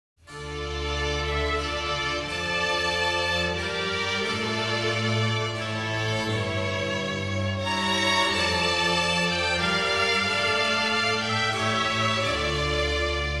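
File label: Organ Concerto in F major - Alla Siciliana; Op.4 No.5; Handel Masterworks; Klassika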